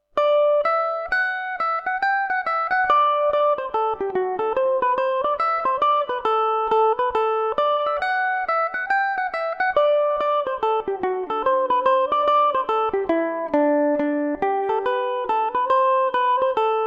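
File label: D mixolydian revelation, Irish mandolin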